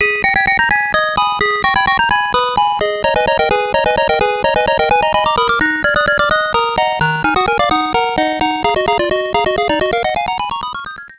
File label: tandy, pc